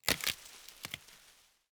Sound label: foliage break